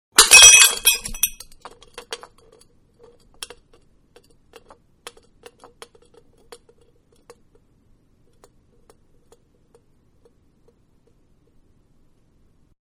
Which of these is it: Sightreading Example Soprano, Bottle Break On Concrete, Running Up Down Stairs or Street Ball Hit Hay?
Bottle Break On Concrete